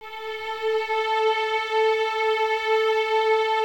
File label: A 4, String Ensembles Violins; Miroslav Vitous; 11 Violins